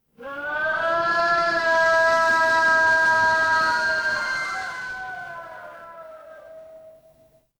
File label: crowd cheer